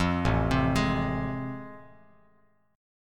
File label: A Minor Major 7th Sharp 5th A, Bass Standard; arpeggiated; AmM7#5; 13,0,10,13; JGuitar